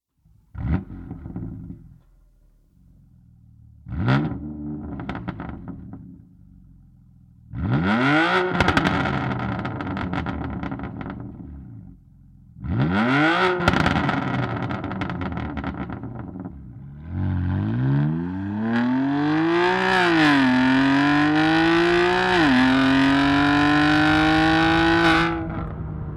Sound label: Downpipe BMW M4 OPF